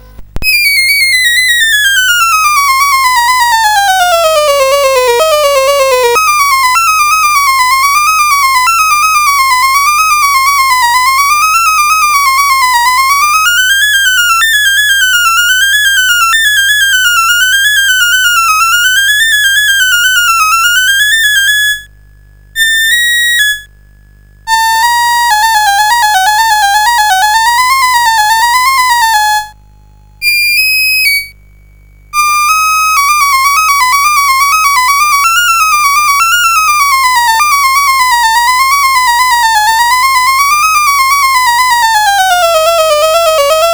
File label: Buzz Off, CSG8580R5; GAMES